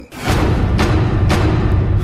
suspense drum; pbb

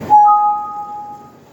windows warning

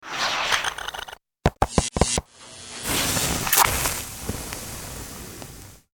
pocket christmas card sounds

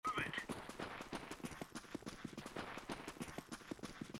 passos, counter strike

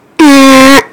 errrrrrrrr

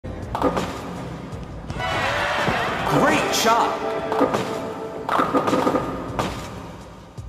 wii bowling spare

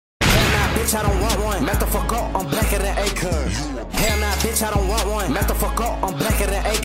glock 40; spaz

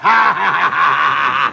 laugh

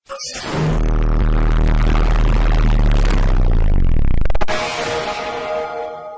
bass; xbox

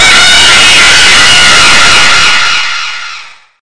jumpscare; prototype laa laa

